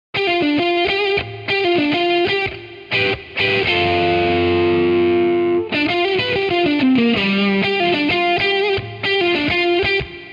les paul amplif; chiqui audio

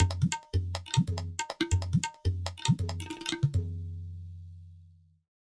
tabla room close; nacre

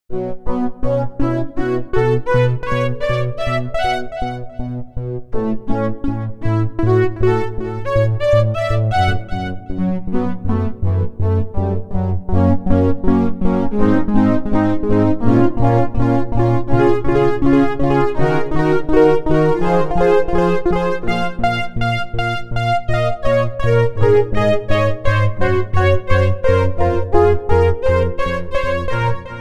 carbon lfo seq; the space soundset

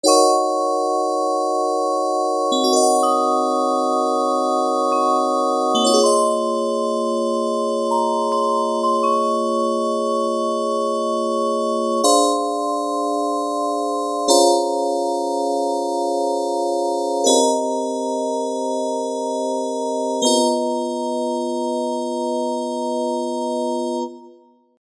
electric piano

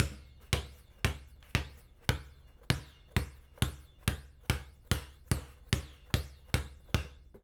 dribbles ball bounce basket; sporting goods; nova sound hoops; sporting goods master collection

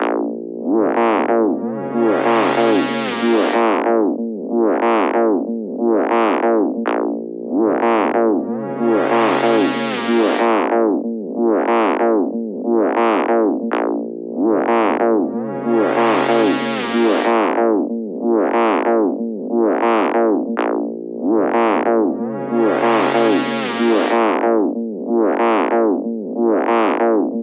wobble dry synth; 23dsp

